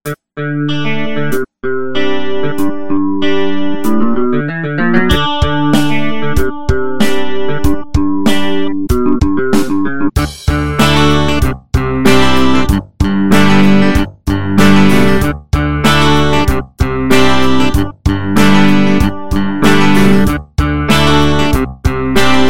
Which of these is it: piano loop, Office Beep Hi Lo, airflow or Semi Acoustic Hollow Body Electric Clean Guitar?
Semi Acoustic Hollow Body Electric Clean Guitar